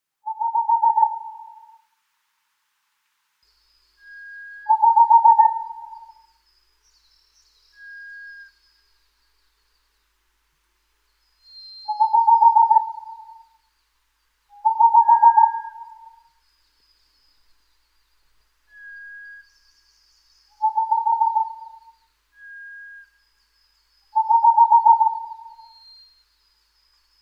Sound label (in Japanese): kinme fukurou, bird